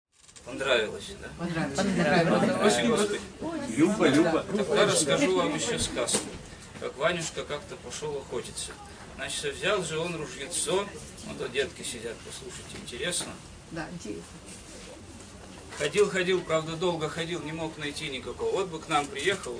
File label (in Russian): gusli